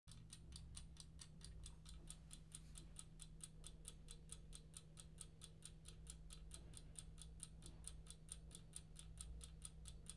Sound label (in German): weckerticken und -geklingel; geraeusche digital